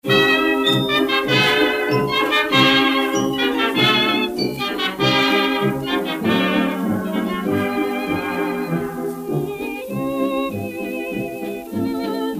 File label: Old Record